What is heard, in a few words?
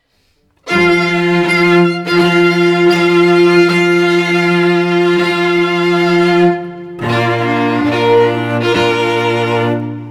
Strings, Bridal March Wagner